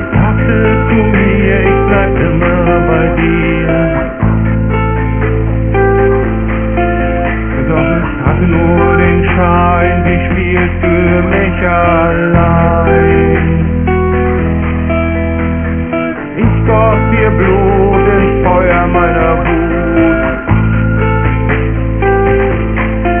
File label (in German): Klavier